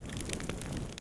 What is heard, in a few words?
campfire; Smite; Sol